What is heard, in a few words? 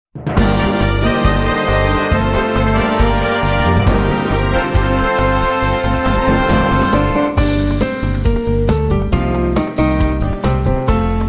Instumental Piona